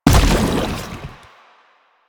Step Default, Footsteps

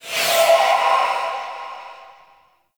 Evolving Weapons UI Node Select; Zariman

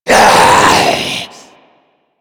Zombie Attack, Vocals; Mariner Zombie

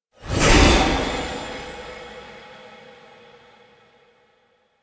Horse Appear; Foley Actions; Warframe; Vehicles; Duviri

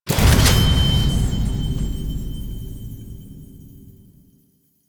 Weapon Select Confirm; Warframe; UI; Duviri

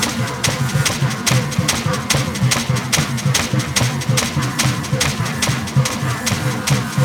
Gas City Giant Fan Loop; Ambience; Props; Warframe; Corpus Gas City; Props Remaster

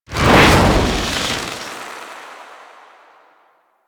Tengu dash forward; Warframe

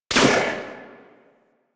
Pistol Shot A; Bard

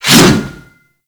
Axe Swing; Warframe; Weapons

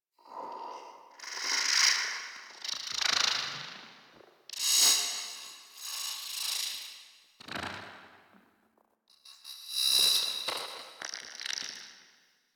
Tech One Off, Ambience; Vitruvian Memory

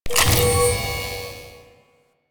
Camera Aim Activate; Warframe; Veilbreaker; Quests; Kahl

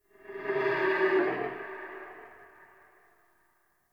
Stag Vocal Pain Idle Echo

